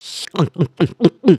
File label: drink